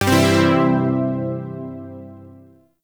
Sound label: Shawzin Pent Maj Chord G; Chords; Warframe; Zariman